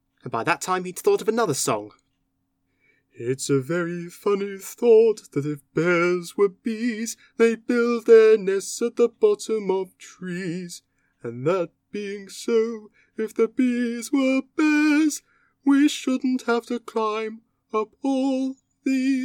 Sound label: song; diff-voices